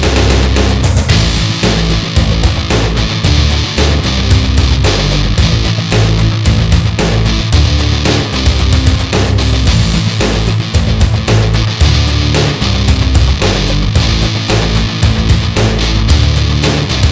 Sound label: ROCK